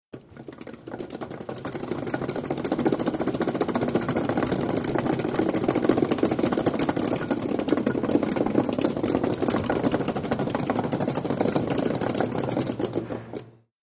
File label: HOSPITAL EQUIPMENT HOSPITAL: Gurney cart, constant run, close to wheels.